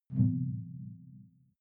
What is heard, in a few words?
sci-fi alien bloom soft; swooshes-swishes